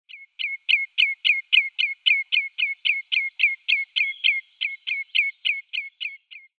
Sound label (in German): Rotschenkel Tringa totanus